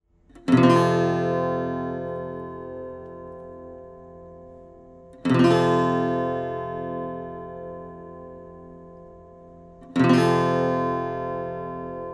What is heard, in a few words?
Papoose Chords; 18inches; Presonus Jensen Xfrmrs